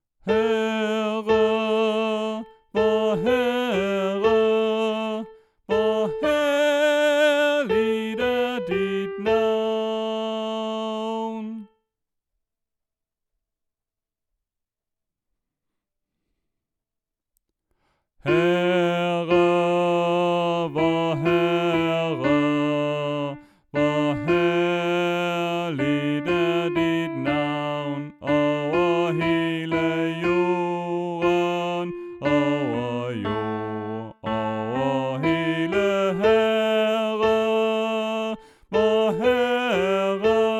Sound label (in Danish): Herre vor Herre Alt; oevefiler